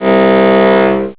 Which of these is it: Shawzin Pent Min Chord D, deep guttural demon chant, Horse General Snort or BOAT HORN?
BOAT HORN